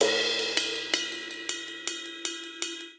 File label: Energetic Pop Cymbals Only 160 bpm; 4 4; MIDI Clips; Ableton; Factory Packs; Drum Booth